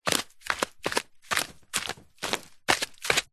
foley footsteps walking down stone steps flip flops